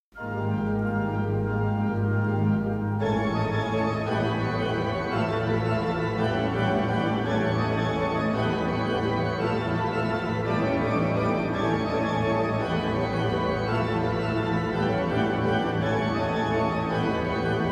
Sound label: Spanish Dance, organ; MiguelPerez; bruselas